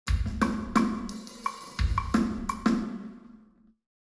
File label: brirs big hall 90deg